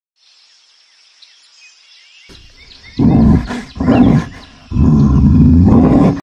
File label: Polar bear; خرس قطبی